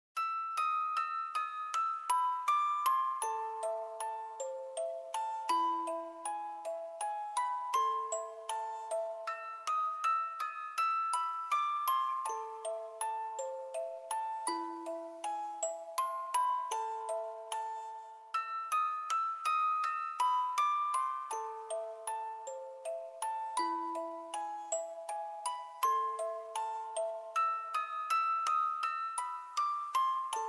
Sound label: Music Box Fur Elise